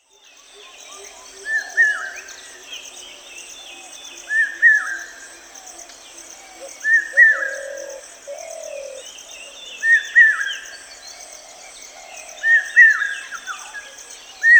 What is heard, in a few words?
common hawk cuckoo; chitwan